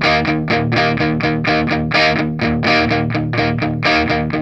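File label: rhythm 125, Vintage Blues Guitar; USB Soundscan vol.22; AKAI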